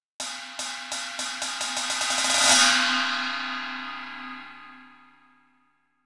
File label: ride